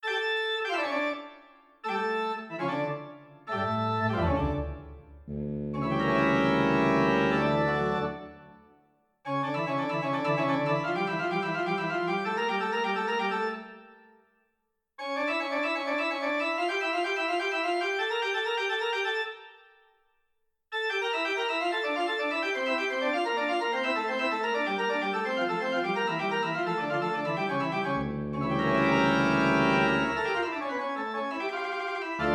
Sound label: Pipe Organ; Toontrack; EZkeys Pipe Organ von Toontrack